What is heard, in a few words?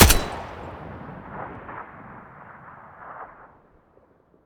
ak12 muzzle mono, weapons; STALKER; IWP